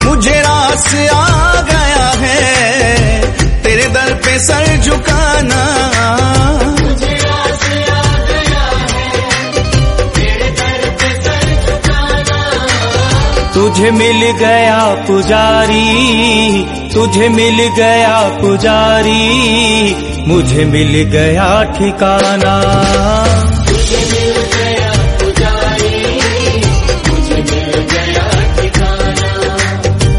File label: devotional hindi